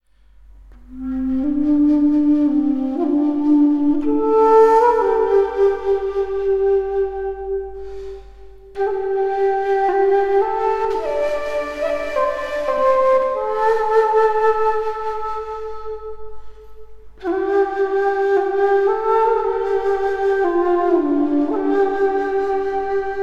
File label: Scene Music; Age of Wushu; Windows